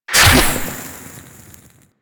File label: Bow Fire; Stalker Bow